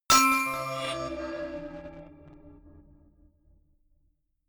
notification glockenspiel crazy third; instrumente

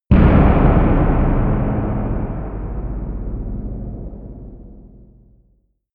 timpani explosion; whooshes rises

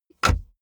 car bmw e46 interior drink holder insert bottle

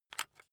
rock drill head remove heavy; maschinen; bohrer; soundpool

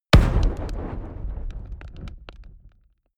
fire impact; explosionen; comedy-cartoon; soundpool

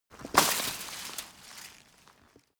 metal impact wire; hits explosionen; metall

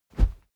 whoosh cloth low soft

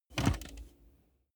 car bmw e46 interior seat adjustment lever

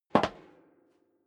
step sneaker metal rattle single left; schritte

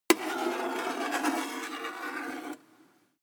table scrape flutter; industrie wirtschaft; metall

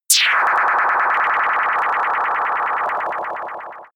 inside shuttle beam, laser; krieg-waffen; soundpool

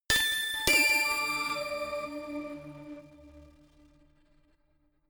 notification glockenspiel crazy fourth up

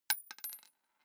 gun bullet drop

